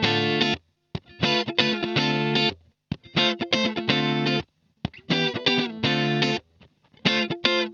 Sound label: DISCO GTR, 124 BPM; Drumdrops; Compact Disco